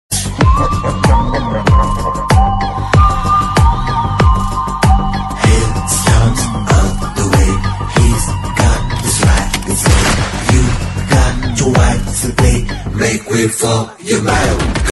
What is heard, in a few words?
kannada mobile call ringtone; gosht